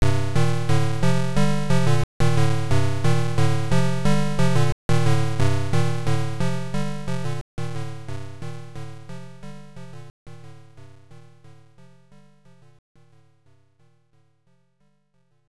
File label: Ghost; Spelunker; Irem M62